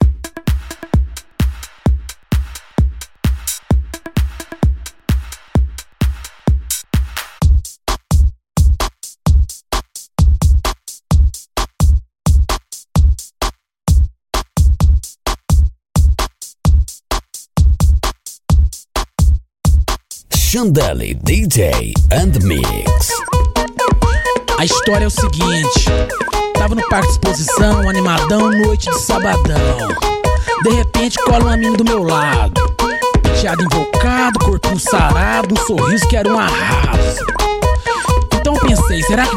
funk com sirene remix

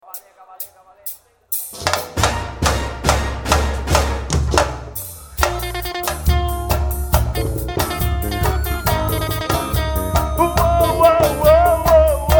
pankada beat; equipe raiao; ricardo cds

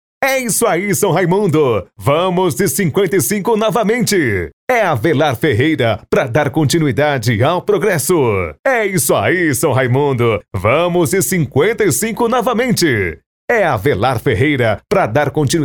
vinheta para convencao de partidos

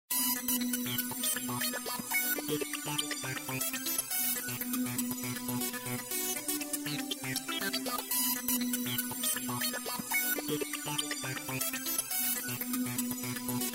abertura

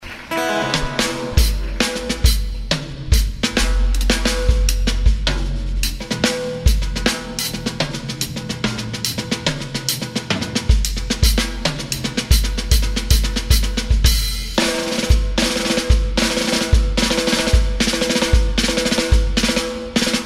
batera solo

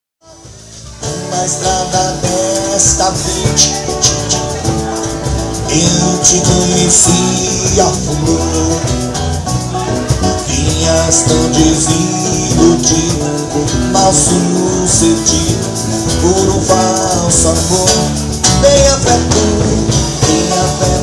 samba trio; curitiba

